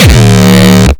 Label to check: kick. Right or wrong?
Right